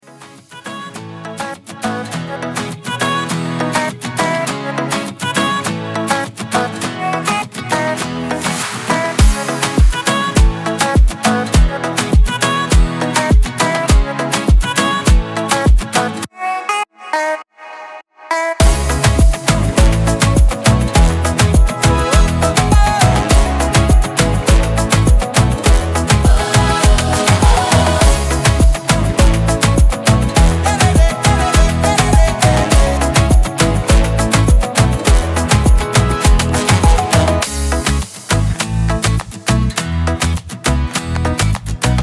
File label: afro latin